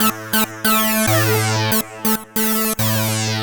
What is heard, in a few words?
TECHNO SYNTH 140; Keys